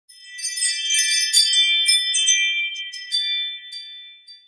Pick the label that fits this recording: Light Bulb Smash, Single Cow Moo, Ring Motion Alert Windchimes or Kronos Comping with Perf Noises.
Ring Motion Alert Windchimes